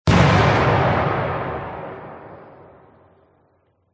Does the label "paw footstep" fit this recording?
No